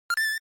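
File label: Game Boy General Pitch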